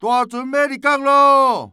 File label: big win